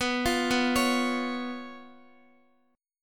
B Suspended 2nd Flat 5th B down Guitar; arpeggiated; JGuitar

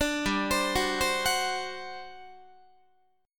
G Major 7th Suspended 4th, Guitar; GM7sus4; arpeggiated; 17,15,15,16,16,17

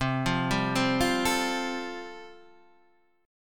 C 6th Suspended 4th C, chord

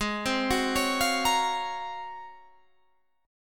G sharp 13th, Guitar, Standard; G#13; arpeggiated; 16,15,16,18,18,18; down; capo=3

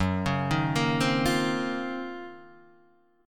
Eb Minor 13th Gb

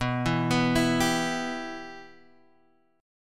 B Suspended 4th Sharp 5th B Guitar Half Step Down

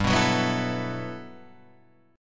G Major 13th; strummed; JGuitar

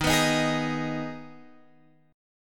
Eb Suspended 4th Sharp 5th, Guitar, Open F; strummed; x,10,11,10,11,10

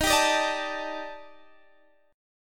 Eb Major 7th Flat 5th Eb Guitar DADGAD

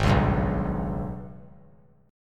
Ab Minor Major 7th Double Flat 5th, down; AbmM7bb5; strummed

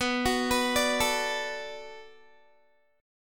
B Minor 7th B Guitar, chord; Bm7 arpeggiated

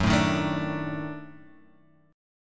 Eb Minor Major 7th Flat 5th Gb, chord

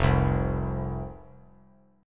F 5th F, down, chord; F5 strummed

